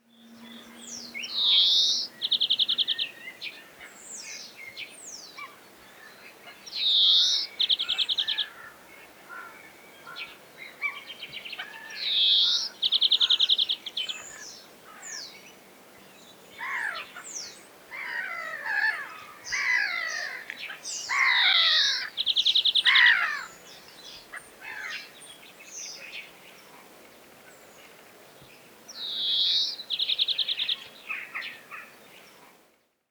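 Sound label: obyknovennaya zelenushka golos, European greenfinch voice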